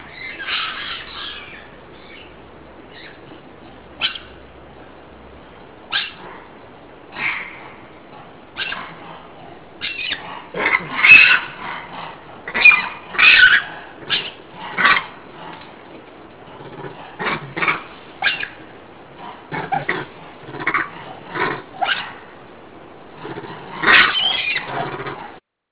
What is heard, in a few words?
monkey; Sensorium; kawasaki